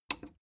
plastic button small simple click slow

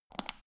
power button turn on off quick

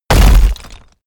stone big impact hard

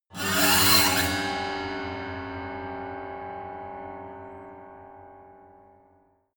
piano bow string broken high

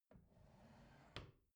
bathroom drawer close soft

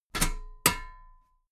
metal ladder step heavy double

